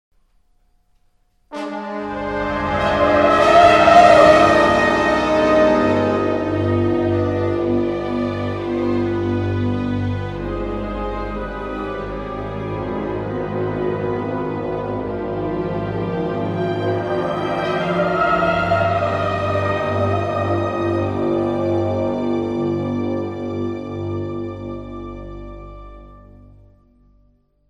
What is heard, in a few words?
elevator start, music; distillery